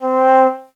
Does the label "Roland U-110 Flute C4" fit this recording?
Yes